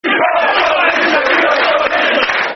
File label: We Are Top Of The League Football Chant; West Ham WHFC